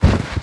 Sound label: slide, Material; XBOX; Freestyle Street Soccer; In Game